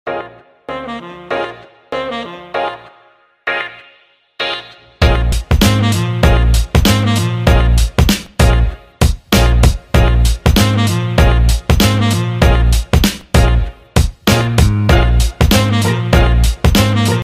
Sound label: backsound music free background music